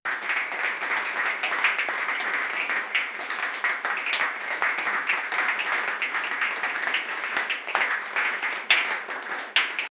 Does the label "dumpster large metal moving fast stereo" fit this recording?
No